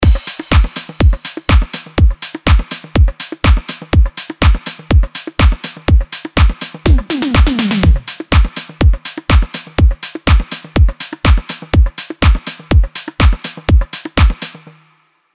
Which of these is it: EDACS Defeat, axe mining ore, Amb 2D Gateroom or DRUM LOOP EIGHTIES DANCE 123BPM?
DRUM LOOP EIGHTIES DANCE 123BPM